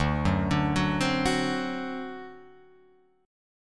D 7th, down, Guitar, Open F; D7 arpeggiated; JGuitar

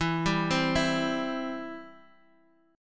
C Major, E, chord

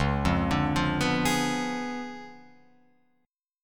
C sharp 7th Flat 9th, Guitar, Open F, C#7b9 chord; arpeggiated; JGuitar

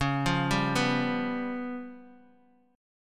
Db 7th Flat 5th Db, down, Guitar Open D

